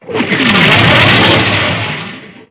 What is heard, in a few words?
portal hit